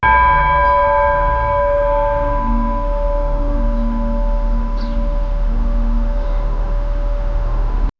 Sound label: church bell ringing in the distance